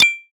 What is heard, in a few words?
Button Click; sound ex machina